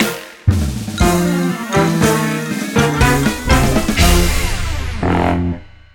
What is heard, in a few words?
Victory Pop